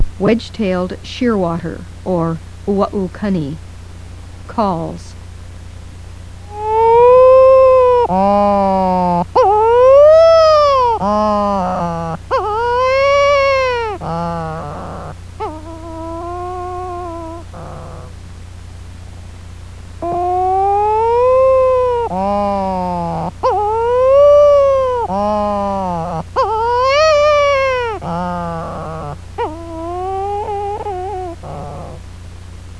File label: shearwater